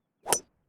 Golf tee off

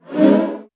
Power Up

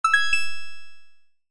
Coin Pickup

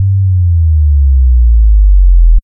Sub Bass 3 Seconds

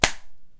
Hand Slap

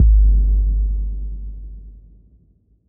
Bass Hit

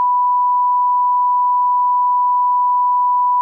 Test tone 1000 Hz

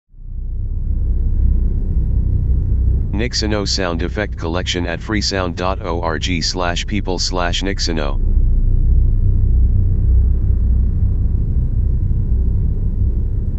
Horror Pad And Ambiance

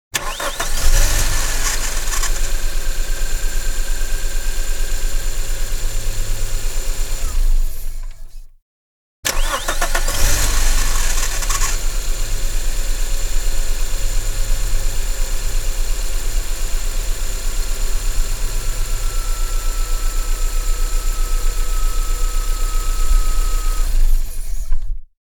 Car Engine

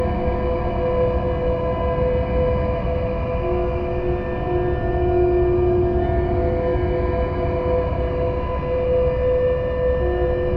Soundscape Horror

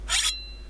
sword

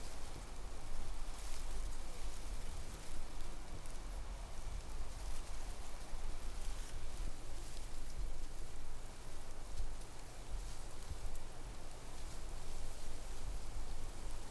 Ranch Ambiance Wind Field Grass

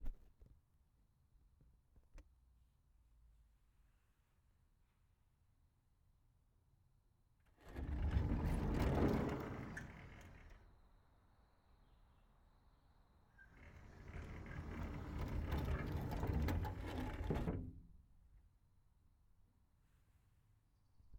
Glass Sliding Door Opened And Closed

